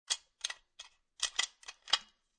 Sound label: Flask Metal Open Twist Cap